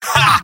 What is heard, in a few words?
scout laugh short; vo; tf2; mvm